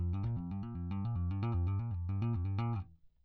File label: bass